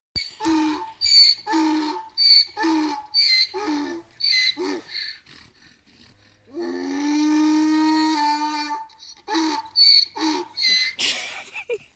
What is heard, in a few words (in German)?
esel; IA; herzzerreissendes IA; München-Venedig Abschnitt D (von Belluno nach Venedig)